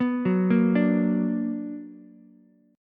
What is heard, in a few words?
B Minor Major 7th, Ukulele; arpeggiated; JGuitar